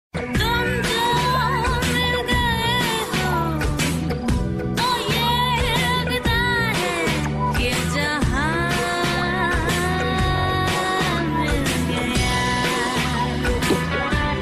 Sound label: ad song ringtone; pepsi